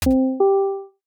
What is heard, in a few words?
character entrance two step; video editing